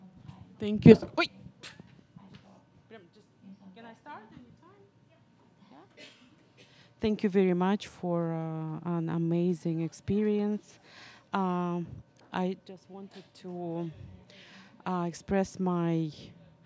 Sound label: Audience Recording; a-meal-premiere